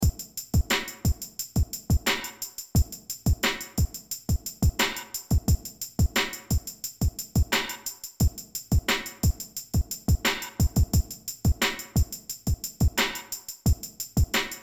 starts With The Drum Beat 88bpm

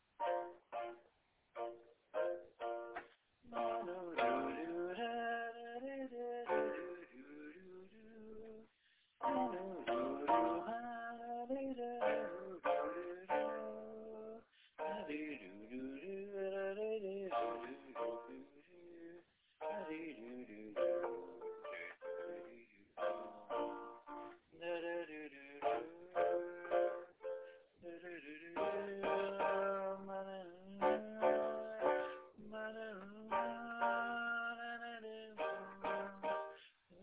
sincere piano and voice

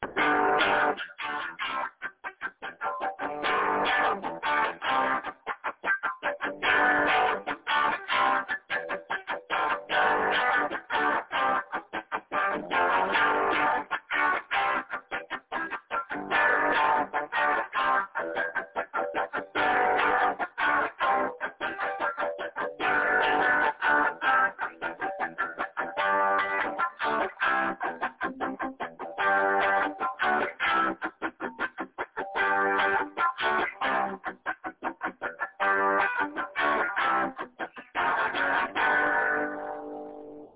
rock chicka chicka seventies chords

